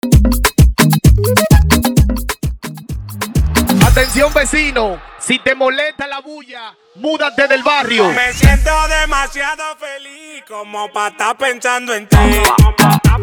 intro hype 130bpm

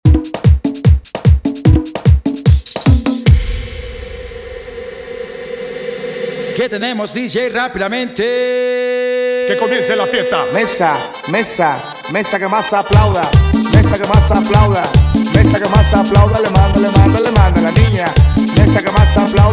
climax 149bpm

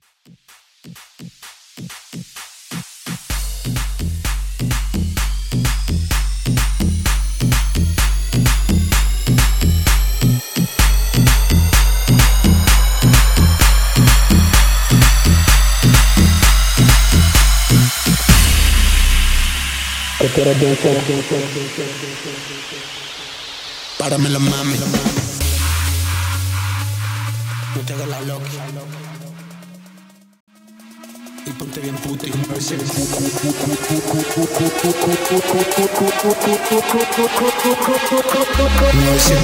Remix 128 BPM

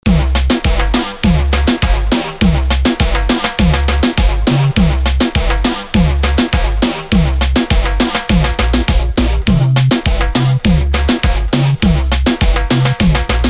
intro 102 bpm

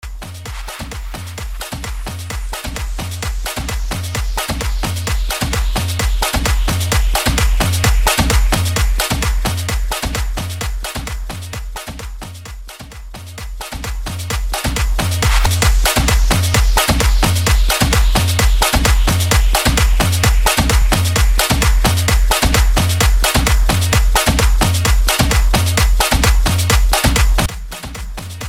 130 bpm

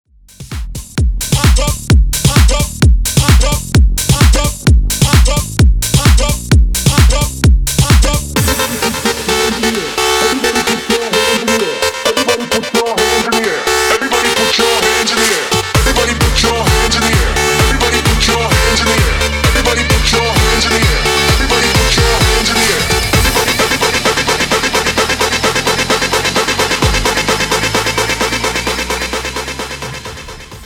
Remix House Bootleg remix 130 Bpm

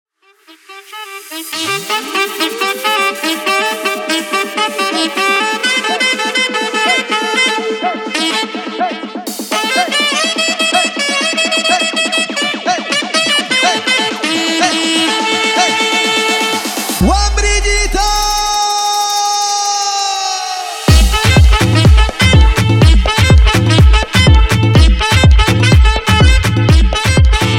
intro outro 125 bpm; Open Show Original Mix

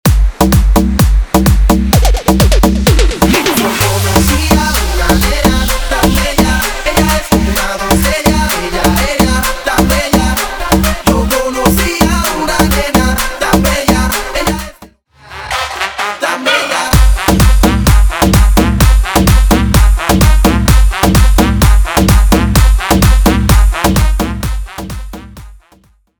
doncella aleteo 128bpm

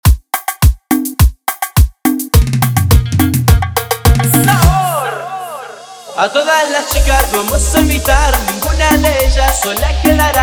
cumbia 105bpm

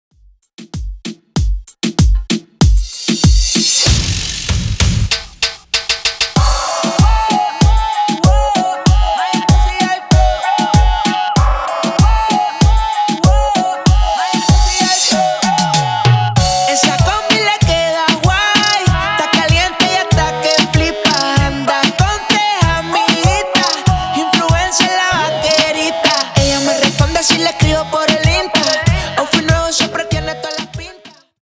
Remix Intro Outro 96 Bpm

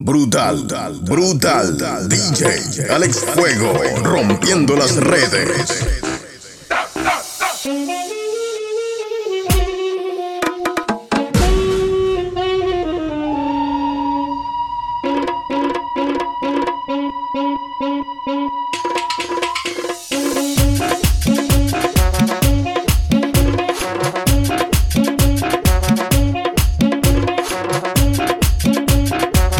GUARACHA ARABE 130 BPM; Prodeejay Remix; Chile

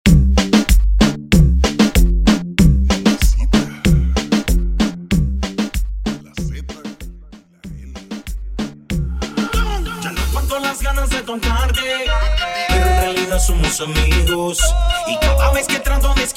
marroneo version 95 BPM

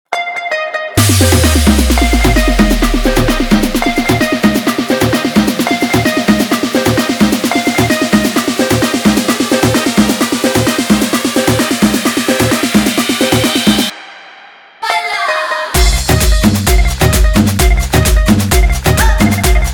intro outro 130 bpm

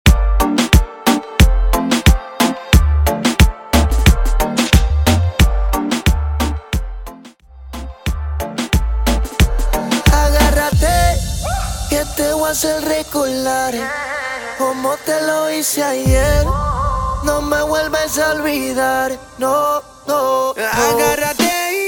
Percapella Intro Outro 90 BPM

